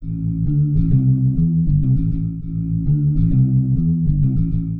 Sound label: Warped Bass, 100 A; Loops; Funk Monk; Touch Loops; CosmicFunk